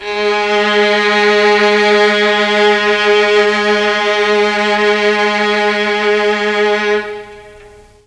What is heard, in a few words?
G#1 VLNS, Mellotron; CHMB 3 VLNS; Mike Pinder - Mellotron Archive CD